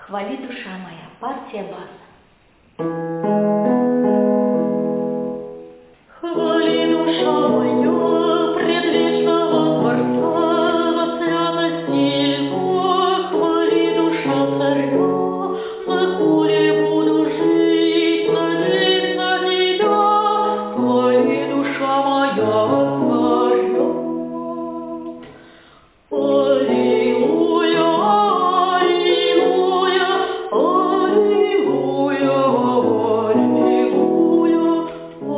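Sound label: bass; Xvali dusha moja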